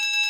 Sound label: count Up Loop; piratefromtheeast